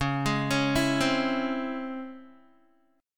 Db Minor Major 7th Db Guitar; DbmM7 arpeggiated; JGuitar

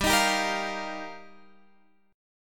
G Minor 7th Sharp 5th, Guitar, Standard; strummed; x,10,13,12,11,13; JGuitar

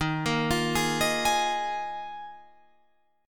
Eb Suspended 2nd Suspended 4th, Guitar, Drop D

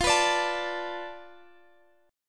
F 6th Flat 5th F down Guitar Standard, chord; strummed; capo=3

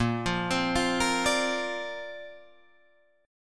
A sharp Major Add 9th, Guitar Drop D

